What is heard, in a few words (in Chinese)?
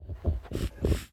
搜寻声; 嗅探兽